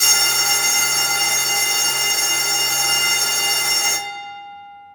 tram bell; best service Blue Box

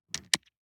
DICE on Neoprene, Grab, Standard, 6 Six Dice; Samples; ArticulatedSounds; DICE Sfx Party (Extended)

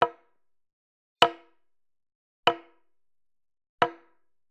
Generic Click Wood Small; boom library; The Interface

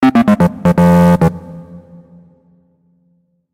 Pong Opponent Win; Pitch Black Audio Pong

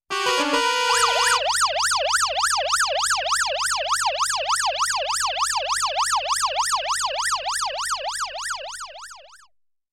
Traffic Staging With Police Siren

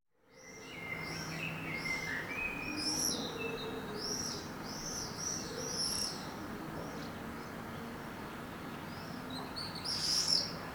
Birds Swifts & Housemartins; Birds, Insects & Animals

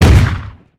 gun shot; Blind Drive

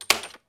Snap Windshield Wiper; SFX Kit; Foley - Misc

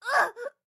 town human road hit; Blind Drive

